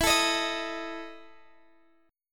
E Major 7th Suspended 4th E, Guitar, Open D; chord shape

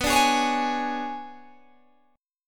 B Minor 6th Add 9th B down Guitar Open G

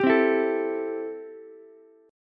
C 6th C down Ukulele; C6 strummed

